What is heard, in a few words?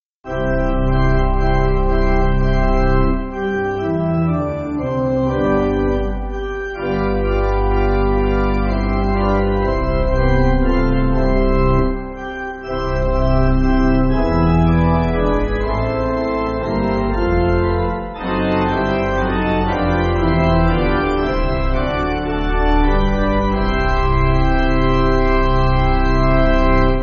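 Pipe Organ, Music Backing Files for Churches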